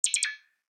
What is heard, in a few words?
construction robot; factorio 2.0.55